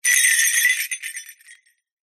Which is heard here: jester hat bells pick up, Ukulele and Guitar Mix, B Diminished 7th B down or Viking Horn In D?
jester hat bells pick up